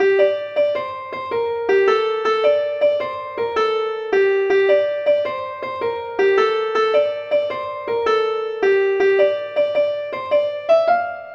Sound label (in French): suite ronds